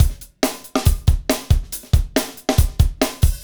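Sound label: beats funk, Roland; Northstar - Drumscapes Roland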